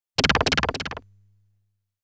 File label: Boss Fires a Bullet